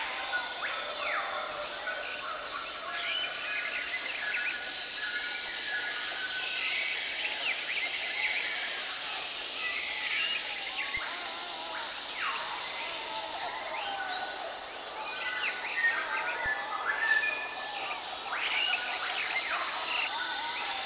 jungle ambience

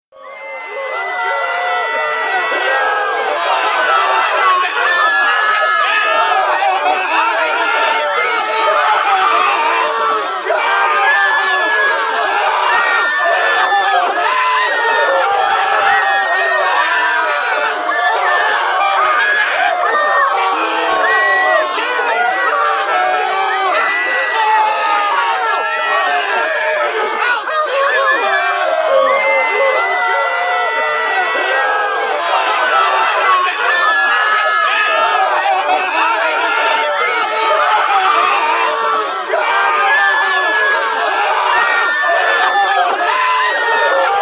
Crowd Screaming

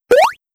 Cute, aesthetic sound effects

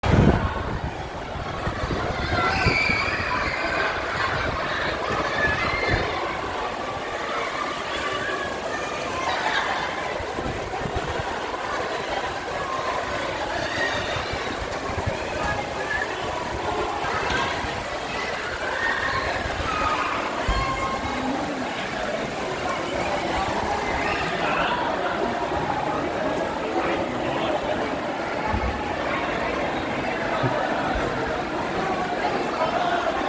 rainy schoolyard with distant voices

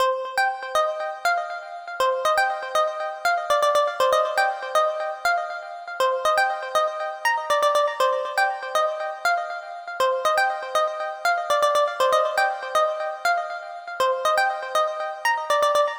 brazilian funk echo lead bounce, 120bpm, C minor

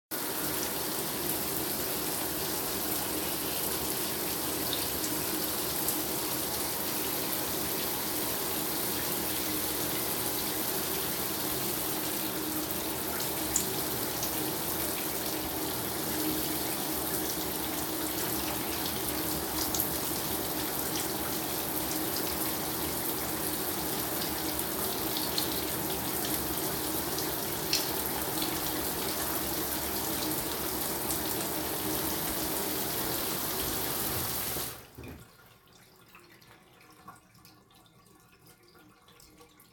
Sound of water shower

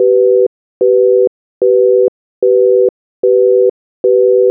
phone dial tone

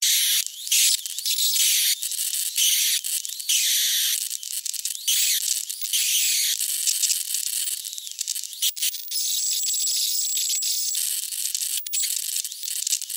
Electrical Arching Electricity, Arcing & Sparks

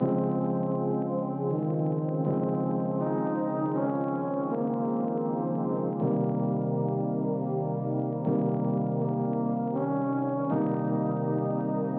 lo-fi vinyl trumpet, 80bpm, C major

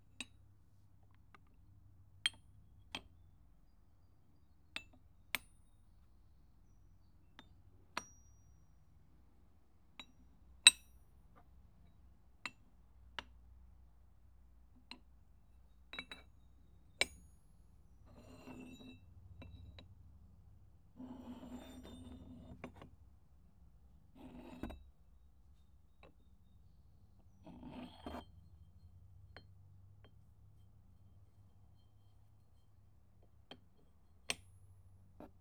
Aluminum Bar Stack

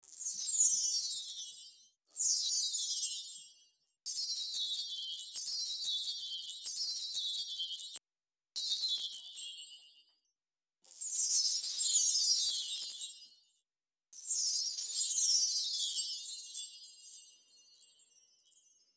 magic sparkle

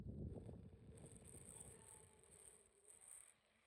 Blowing for Wind Noise

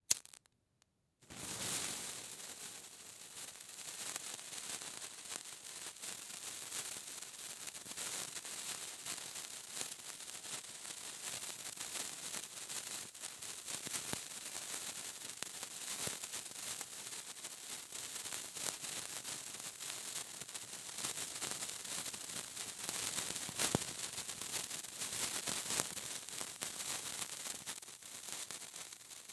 Fire Sparker (Lit & Burn)

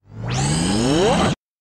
Robot Power up Hydraulics, Servos; Robotics; Greatest Sound Effects

